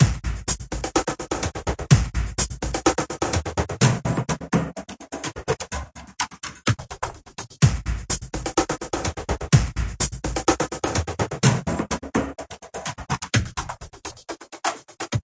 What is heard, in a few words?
epic drum stutter, drum loops and breaks